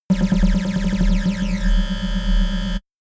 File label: shot ringing wobble charge; pulsieren